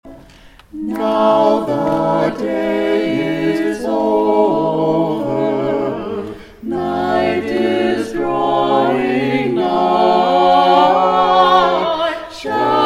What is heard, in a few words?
choir